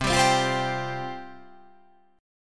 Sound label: C Suspended 4th C